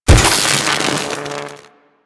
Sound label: Electro Prod Hit Flesh; Warframe; Weapons